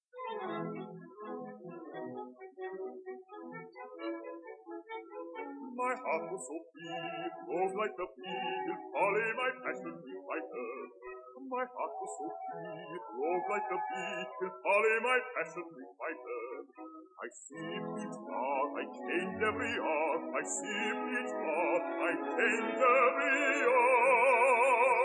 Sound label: air